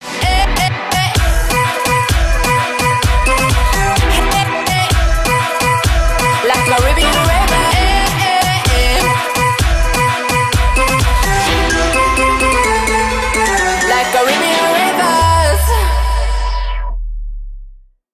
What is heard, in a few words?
caribbean rave